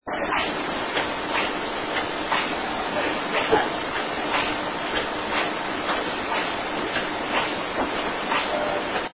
Living Room grunt

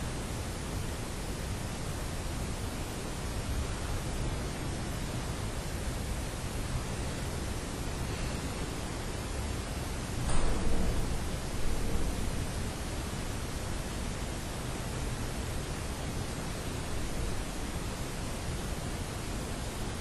Unexplained noise; Case 023 Major Graham Mansion; Childrens Room; Olympus Plug

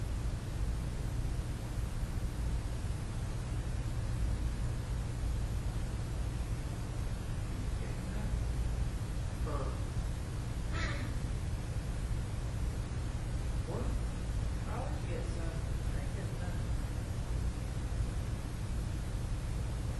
Unexplained noise (Duck maybe); Case 017 Barter Theatre Stage

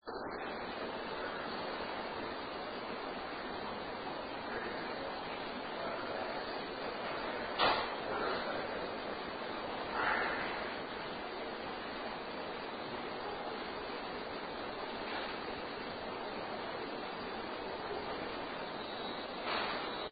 Art Room, knock; Case 012 Pocahontas Fuel Building 2nd Floor

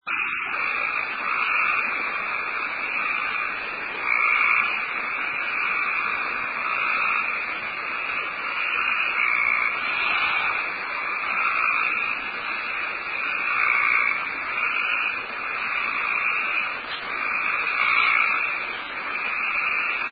Unexplained BREATH; Case 022 Lake Shawnee Park; Trailer

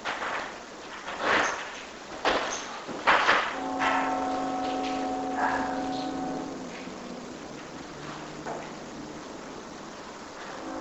Unexplained Breath 2nd Floor Mid section

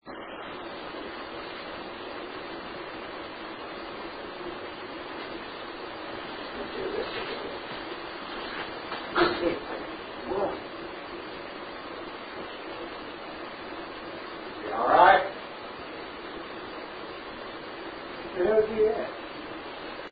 Town Hall Main Office door slam; Case 013 Pocahontas

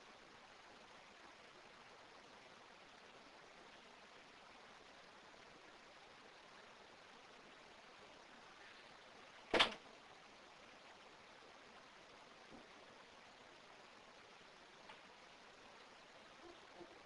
Unexplained STAPLER, Laundry Room; Olympus DVR; Case 021 Zepp Home

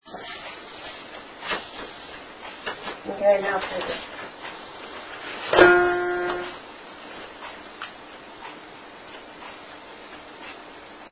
Living Room, guitar

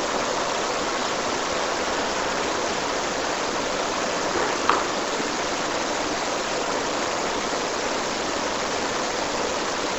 Unexplained Noise 2nd Floor Mid section

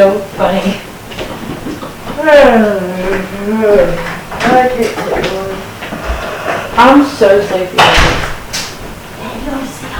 Unexplained breath Amplified

